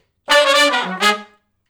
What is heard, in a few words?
Funk Riff (E) unison, Horncraft for R&B; Sections; Crimson Blue and Fabulous Horncraft 4 RnB